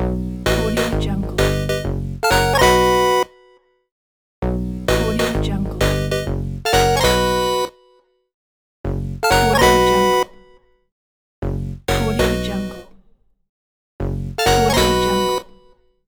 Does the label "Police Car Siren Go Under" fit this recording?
No